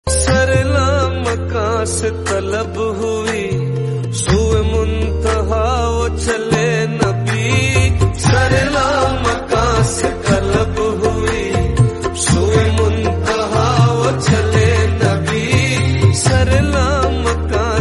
naat

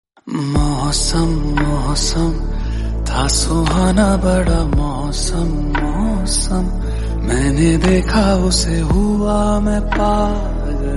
romantic hindi

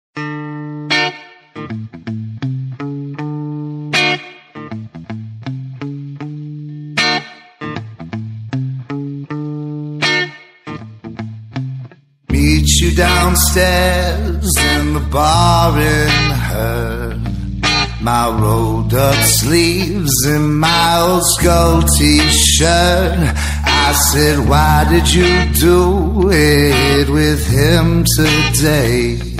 theme, background music; peaky blinders

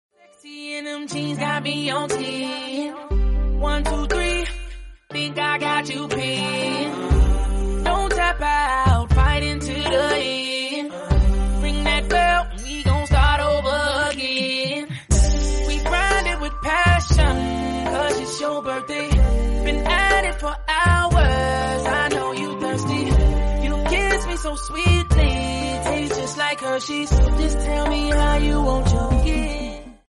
english song

